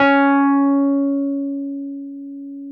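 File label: Wurlitzer electric piano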